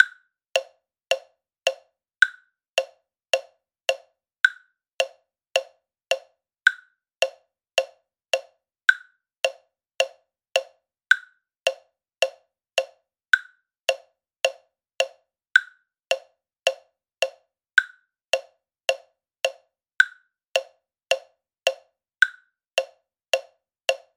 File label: Click Study Accented Run Around Click, drum kit